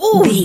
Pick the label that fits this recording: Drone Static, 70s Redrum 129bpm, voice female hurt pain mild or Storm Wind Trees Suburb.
voice female hurt pain mild